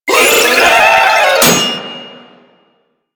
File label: Volatile Runner Explosion Warning; Enemies; Abilities; Proto Infested Volatile Runner; Warframe